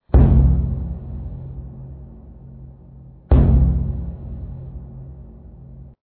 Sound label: instrument taiko